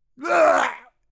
male death

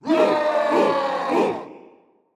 Special Building (iberians), completion; 0AD

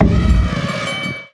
elephant stable; interface; building

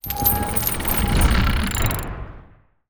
alarm onager

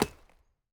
lumber tree

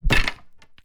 pike attack

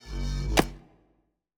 lumber tree expire

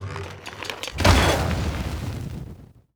onager attack

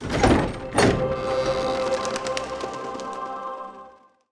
Temple, complete; 0AD

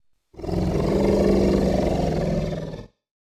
elephant trained; fauna

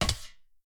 arrow metal, attack impact

